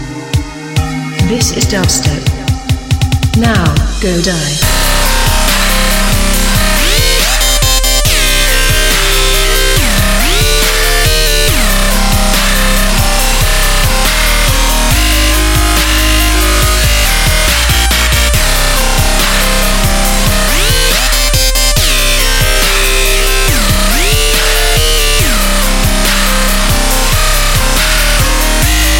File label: Dubstep